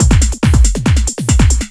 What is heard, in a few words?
Beat, Drums & Percussion; Electrocution; Beats